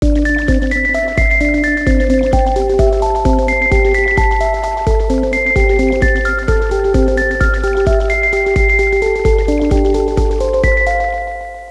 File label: Genesis Melody